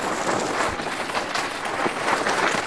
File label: Ski Loop; Misc Sports